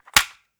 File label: Cassette Rattle